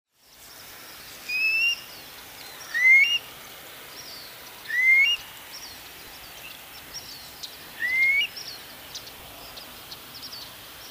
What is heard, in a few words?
Long-billed Curlew, Numenius americanus; shorebirds rails